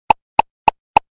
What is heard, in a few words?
Facebook Click